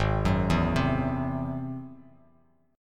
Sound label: G sharp Augmented 7th G sharp down Bass Standard; G#+7 arpeggiated; JGuitar